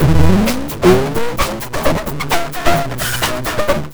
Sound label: FXBEAT; Big Beat Drumloops; FX BEATS; AKAI